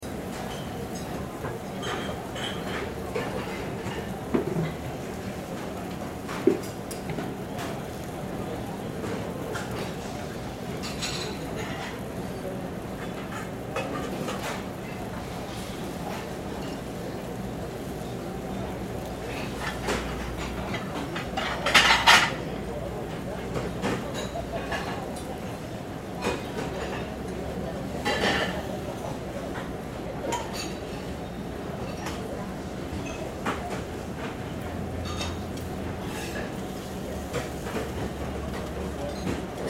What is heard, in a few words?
Kitchen Cooking Cleaning Loop; Kitchen Cooking Cleaning Activity Loop Restaurant; Food Prep; Industry; Blastwave